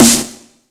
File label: snares; Vengeance Samples